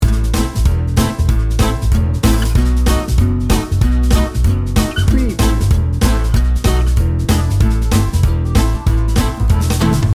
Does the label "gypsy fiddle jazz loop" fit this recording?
Yes